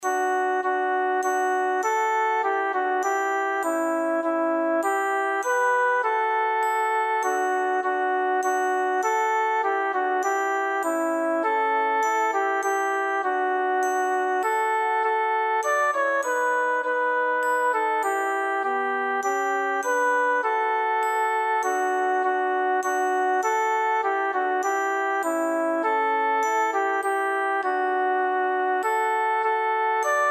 2 VOZ; Llámanos

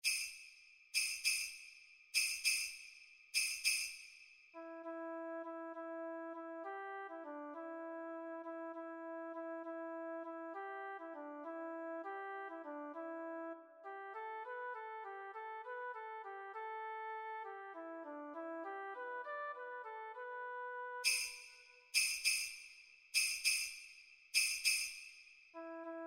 CASCOS; San Martín de Tours

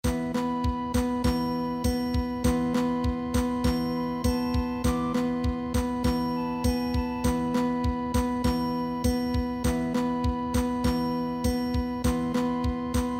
2 VOZ

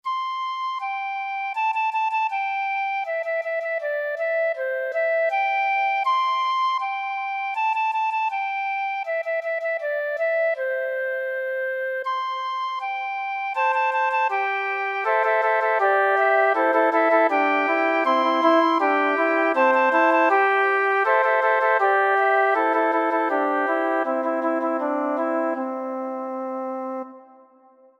Sol Do, Ejercicio de flautas en un canon sencillo

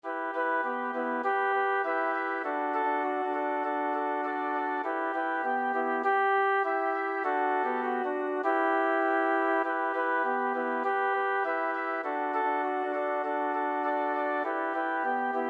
4 voces; Cavo yo

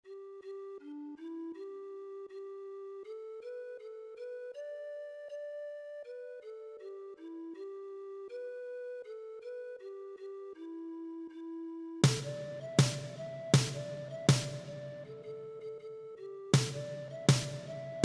PERC

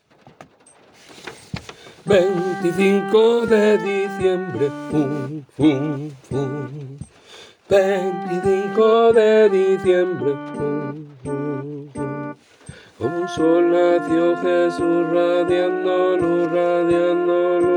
Alto

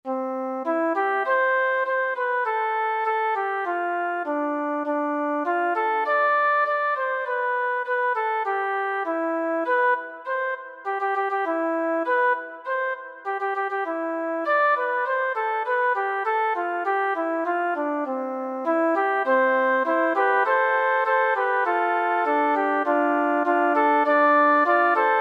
flauta; Dad con la flauta

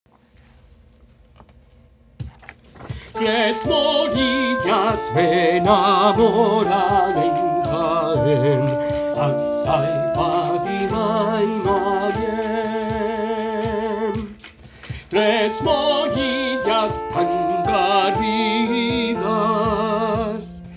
2 VOZ; Tres Morillas

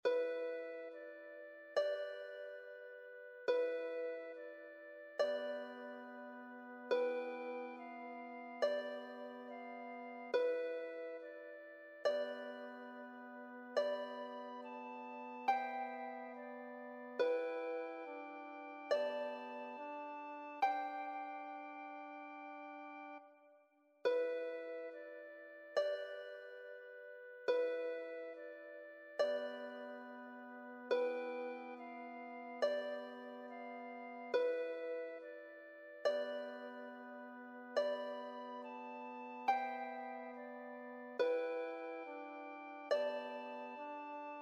Quietud 2 liras

